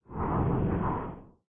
float; smite; thanatos

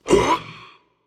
breath; smite; ares

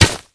pipe ground; smite; xbalanque

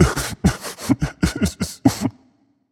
boo hoo; smite; achilles

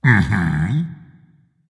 agree dragon; fafnir

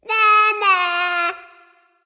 tongue; cupid

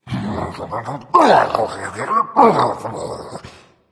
grumble; smite; voicepack; kuzenbo

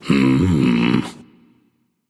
thinking; smite

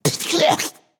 clear throat, voicepack; smite; jorm

